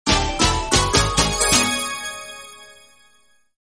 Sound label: win; room